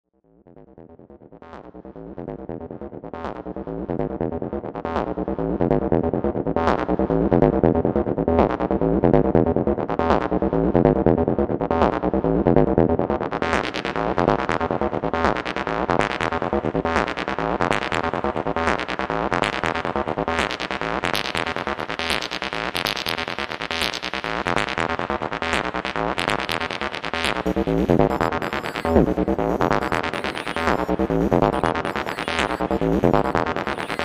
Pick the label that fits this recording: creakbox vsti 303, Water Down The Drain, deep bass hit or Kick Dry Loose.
creakbox vsti 303